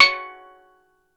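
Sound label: steel drum C#4, tonal percs; Crypton - DrumBase5000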